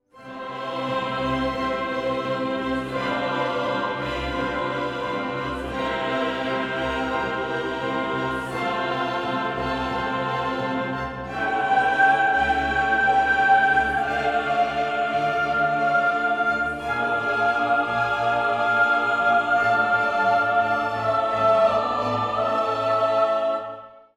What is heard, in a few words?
Sanctus Second loud